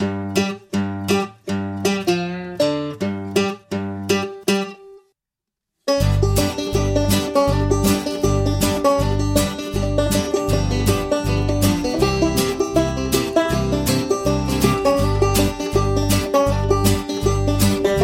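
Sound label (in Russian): зацикленные мелодии для гитары loop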